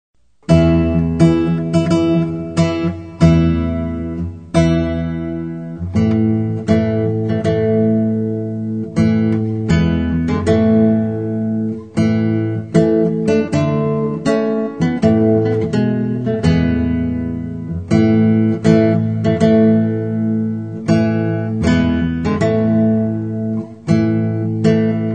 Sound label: bridal chorus